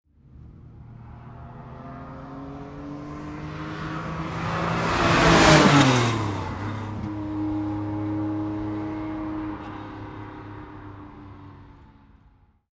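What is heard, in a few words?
sports car exterior porsche rs drive by fast; verkehr-transport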